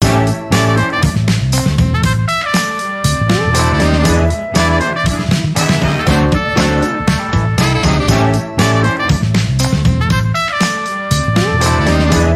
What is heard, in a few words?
hitchhiker music; left4dead2; kodua; fortnite emotes